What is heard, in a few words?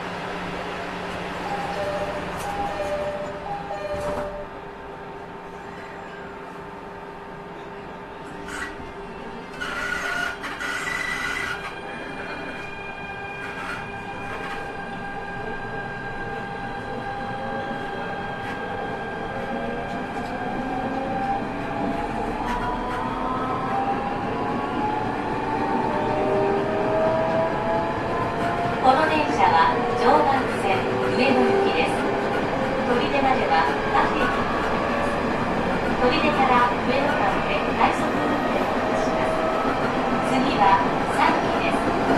joban mito E501